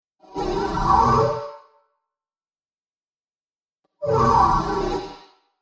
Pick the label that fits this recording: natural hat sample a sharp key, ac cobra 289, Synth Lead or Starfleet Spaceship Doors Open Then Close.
Starfleet Spaceship Doors Open Then Close